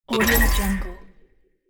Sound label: epic award slam